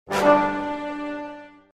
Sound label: Start